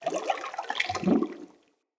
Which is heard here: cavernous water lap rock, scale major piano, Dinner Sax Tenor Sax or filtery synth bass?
cavernous water lap rock